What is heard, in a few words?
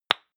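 lipstick open hard, Bathroom; haus-garten; badezimmer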